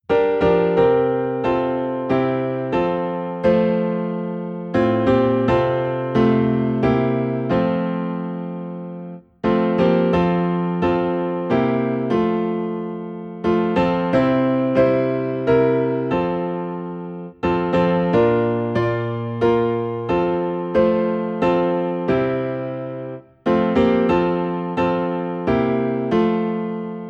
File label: Piano in F; Trust and Obey